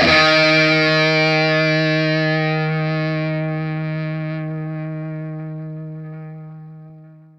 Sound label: SINGLE EF3, Vintage Blues Guitar, ELEC GTR; USB Soundscan vol.22